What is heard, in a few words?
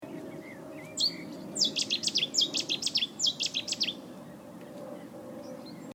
Cettis warbler; Barnes